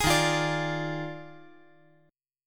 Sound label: D sharp Major 7th Suspended 2nd Suspended 4th; strummed; JGuitar